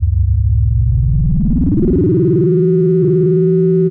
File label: Osc vs Ring Mod, vintage oscillator; radiosonics